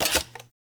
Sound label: Pickup Tablet; Warframe; Cephalon Weave